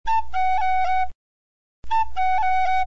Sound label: offbeat roll